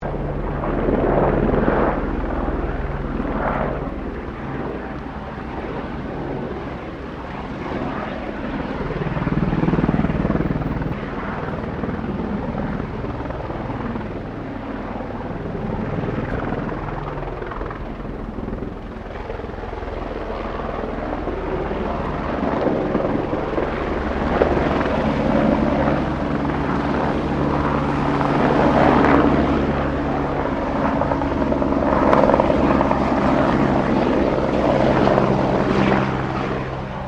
HELICOPTER BELL 204 FIREFIGHTER PASSING CLOSE, Arial firefighting, small bushfire